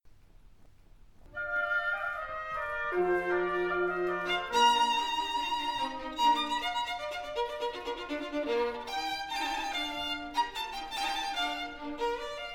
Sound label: Violin Con Bf